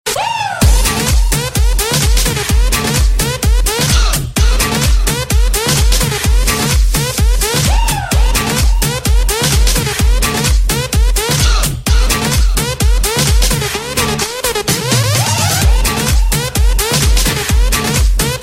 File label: House Remix